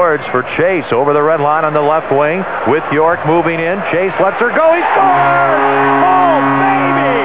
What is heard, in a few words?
chaser